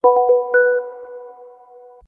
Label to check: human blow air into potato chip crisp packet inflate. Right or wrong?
Wrong